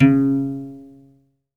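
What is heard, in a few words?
SOLO CELLO, Synclavier Strings; ILIO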